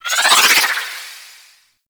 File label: Arch Wing Prime Dodge Roll Right; Warframe; Vehicles